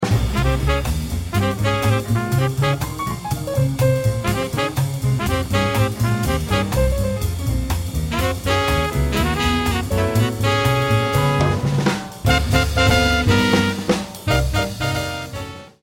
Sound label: minus alto